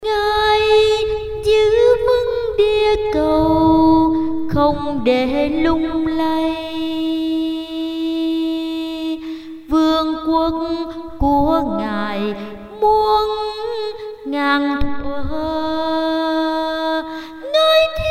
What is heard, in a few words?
basso